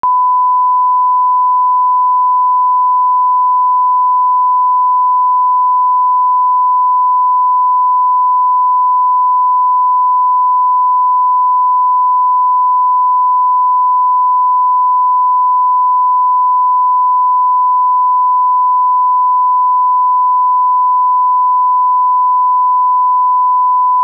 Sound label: Sine 1kHz - LR; NTiAudio XL2